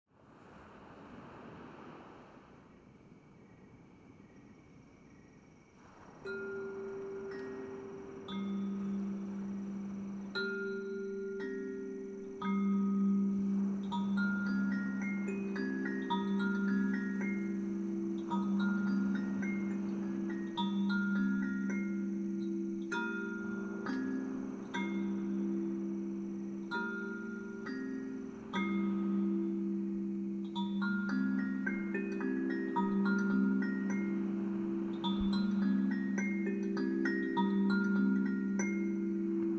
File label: Kalimba; Live Theatre Portfolio